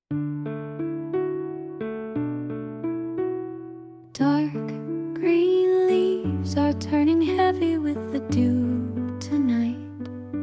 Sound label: lullaby, name songs; daniel